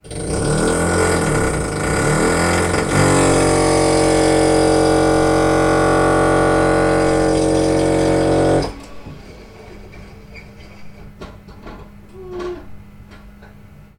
industrial tools drill electric into house wall ext internal rec; sound effects one